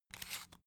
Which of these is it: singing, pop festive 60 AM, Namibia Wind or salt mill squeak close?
salt mill squeak close